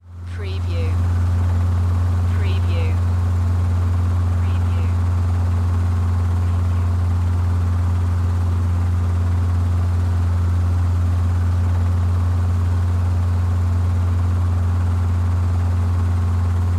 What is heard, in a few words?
Helicopter, Idle, Interior